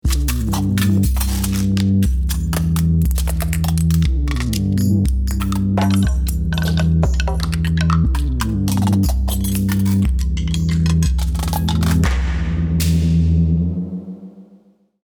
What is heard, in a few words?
claps crisps snaps